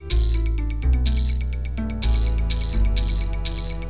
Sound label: music; Egoboo